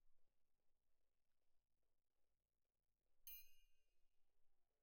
Perc Main

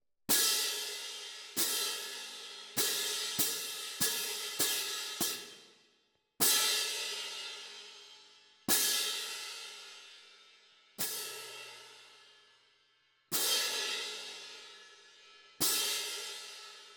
Cymb Close

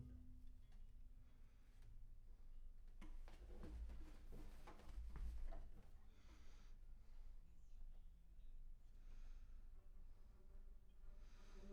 Bass Room

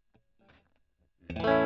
E. GTR Gefell

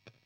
E. GTR AMP SOLO

